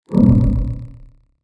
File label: A Teleportation